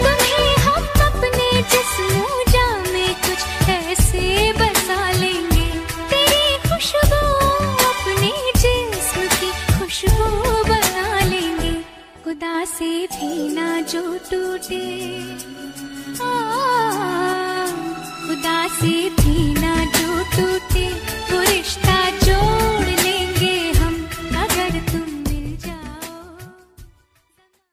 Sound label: bollywood ringtone